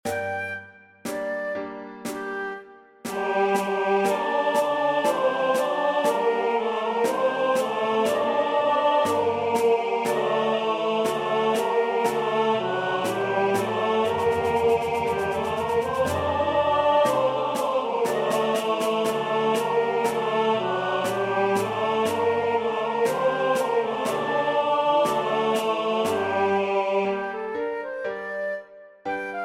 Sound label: Tenor; Patapan; Christmas 2025